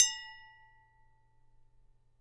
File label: Glass A mf